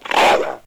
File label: wolvern hit; monsters